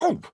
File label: AHurt